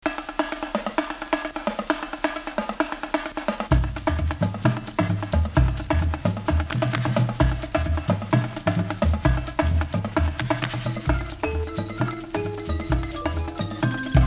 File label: Marching Band; Jensen Publications Marching Band 83